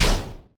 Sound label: Brimstone Shoot